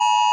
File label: iphone eas alarm short